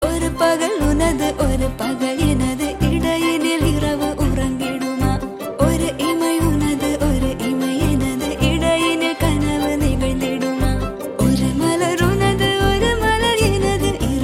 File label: Female BGM Ringtone